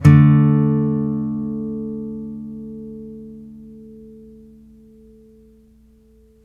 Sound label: 5TH D3, Blues Guitar Samples; ILIO; Fretworks